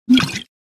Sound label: Potion alchemy gulp